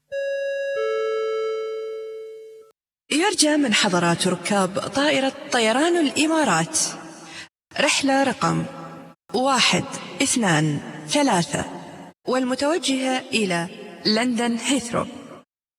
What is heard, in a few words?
free Vocal Acapella; 126.0 BPM; London